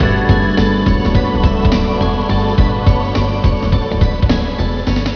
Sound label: better loop; Ghost in the Shell